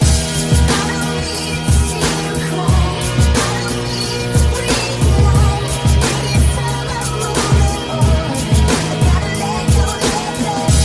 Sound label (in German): deutsches lied klingelton